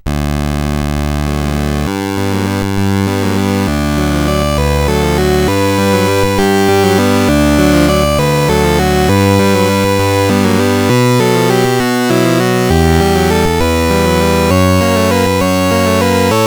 Grand Slam Baseball, sid CSG8580R5

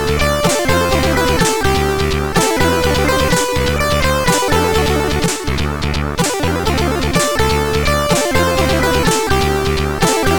New Tune, CSG8580R5; sid

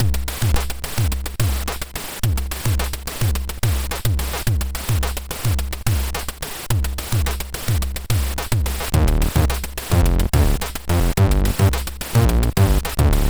XO, MOS6581R3

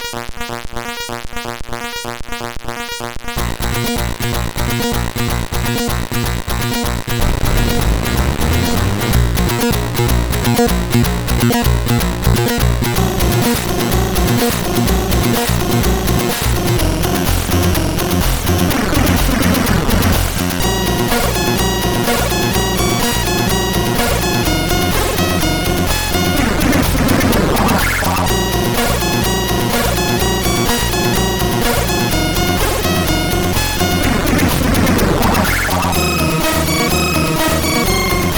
Game Music, sid CSG8580R5

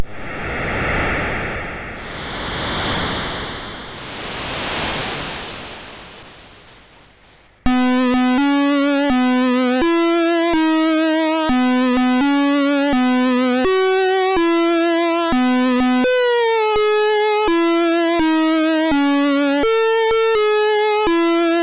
Happy Birthday sid MOS6581R2; Bluez

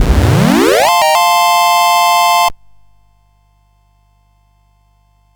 Dragonworld sid CSG8580R5

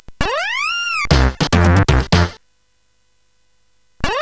Su-Sweet, CSG8580R5; hvsc

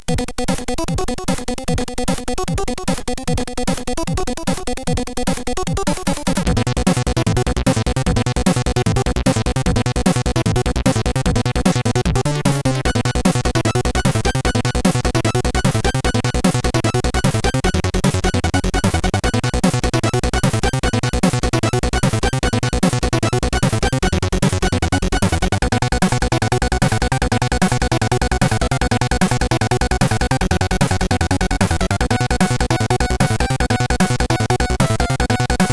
Rave, CSG8580R5

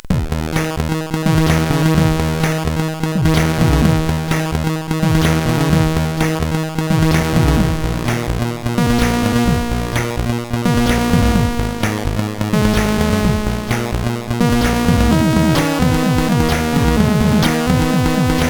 intro sid CSG8580R5; Fighter Bomber

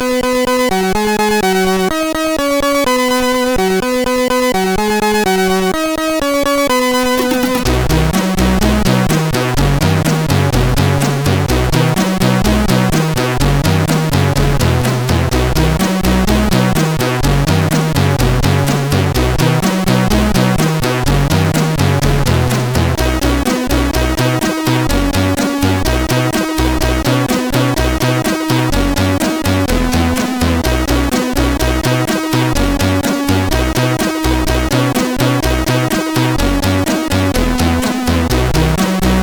Children Songs, CSG8580R5

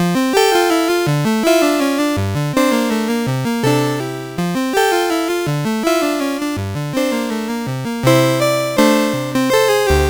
Christmas Waltz, CSG8580R5; D-Bug

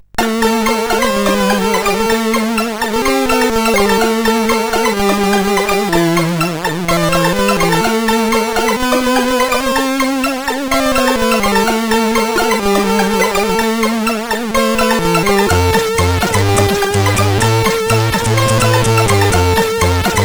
Groovy, CSG8580R5

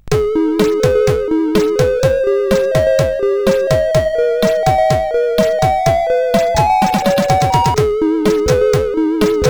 Music Imitation sid CSG8580R5

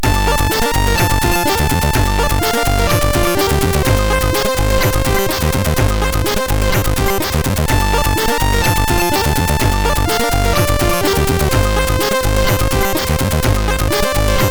Sounds, MOS6581R3; sid

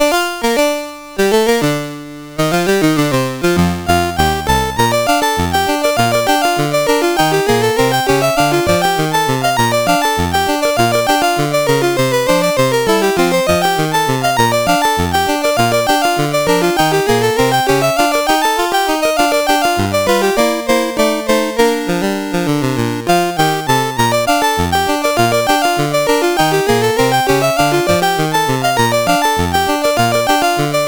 Sunflower Slow Drag, CSG8580R5

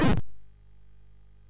sid MOS6581R2, GAMES; Catalypse

